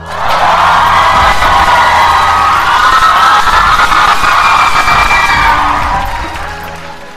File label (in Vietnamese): Tieng o khan gia co vu